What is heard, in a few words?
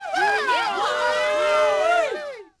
CROWD POS LOW; Cars; XBOX